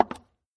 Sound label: step sound; Arc of Templar